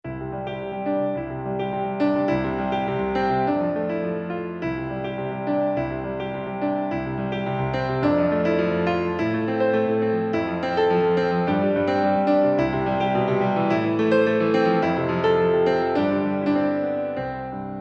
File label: boesendorfer, piano; akoustikpiano